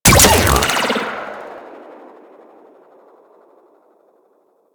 Shock Rifle Alt Shot, weapons; Warframe; Corpus

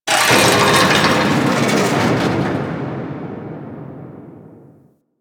Fortress Turret Moves; Ambience; Warframe; Grineer Fortress

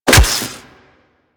Melee Staff Impact Robotic Flesh; Warframe; Weapons; Staffs

